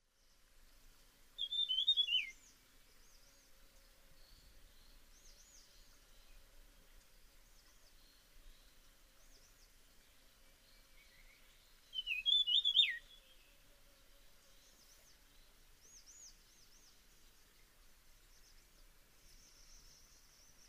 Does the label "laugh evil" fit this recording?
No